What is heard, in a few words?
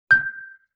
Xylophone Single Note G; Vintage Cartoon; Musical Elements